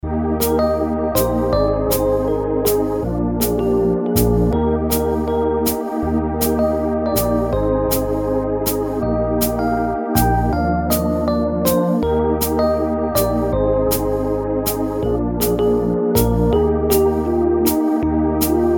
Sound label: MUSIC MENU; Twin Hook; GMTK